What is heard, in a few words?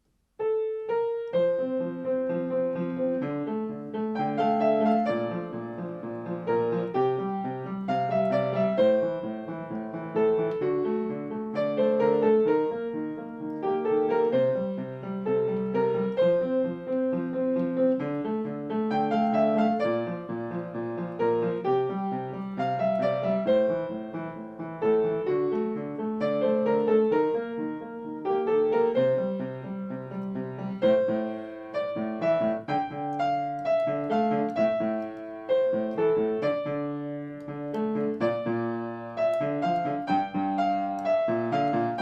Sailing Ship, piano; Final Fantasy I